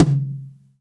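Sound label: TOM soft; Zero-G; Chemical Beats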